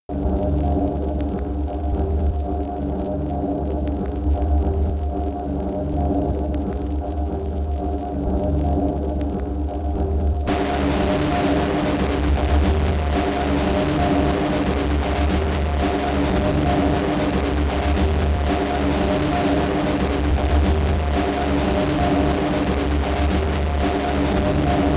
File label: stereo fuzz in use